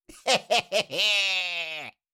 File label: Cartoon little monster voice laugh laughter